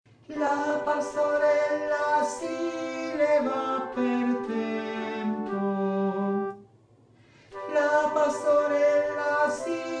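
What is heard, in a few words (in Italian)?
Soprani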